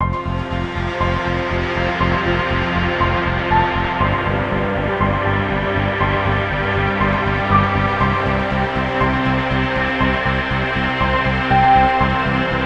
Climb; Night in the Woods; Hold Onto Anything

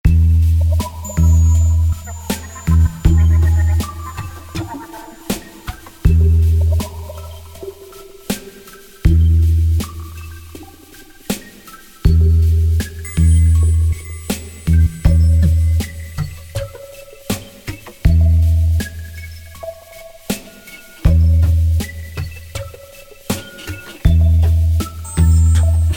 piano; Buck Bradley 2; Android

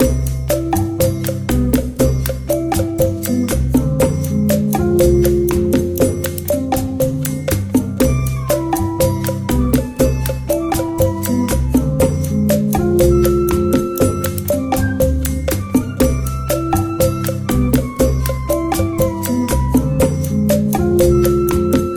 Human Muzak; Salesman Rick; Pocket Mortys

